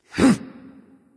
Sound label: grunt attack; smite; ares